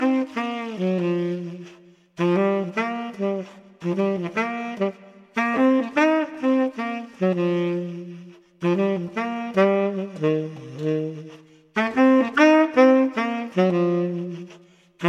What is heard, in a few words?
Classical Harmonium Ringtone